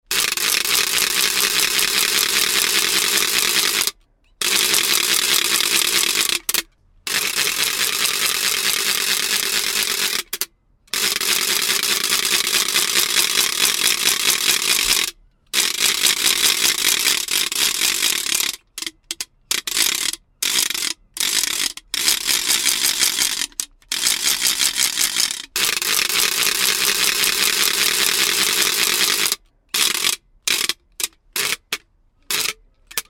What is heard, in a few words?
Football Rattle, Old wooden ratchet Footie rattle; Spinning